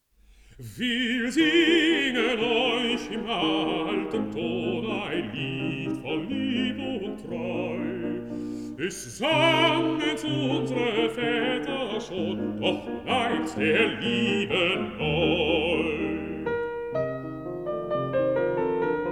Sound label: song for voice & piano